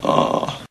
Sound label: "ah"